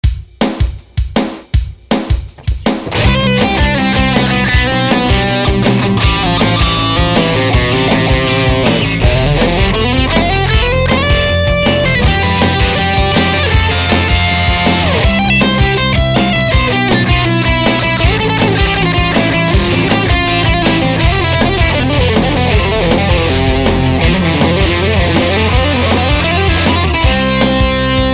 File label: doremi solo